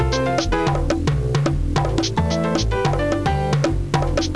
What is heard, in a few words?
song; paradiseisland